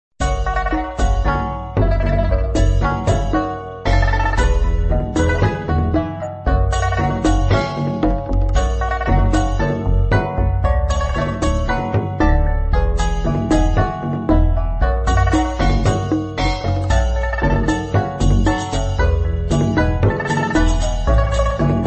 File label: bgm; chess; guess